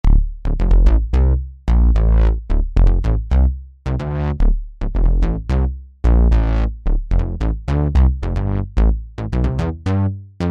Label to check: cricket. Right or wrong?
Wrong